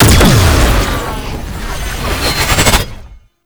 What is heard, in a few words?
Knight Plunge Ability Ground Hit; Warframe; Tau; Tau Dax; Abilities